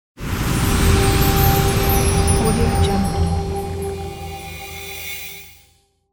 magical spell sound

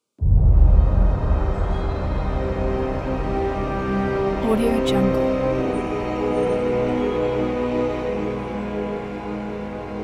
cinematic intro trailer teaser

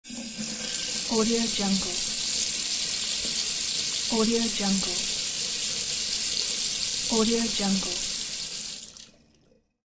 water faucet

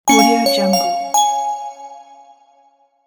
smartphone ringtone

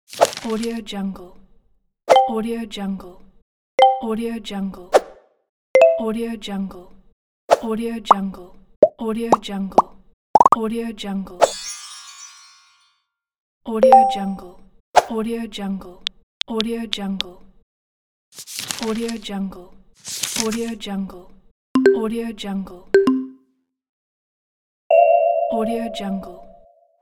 motion graphics sounds

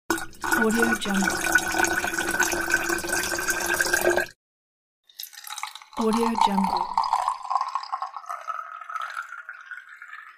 pouring water

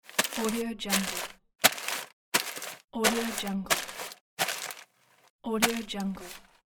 hits on the paper

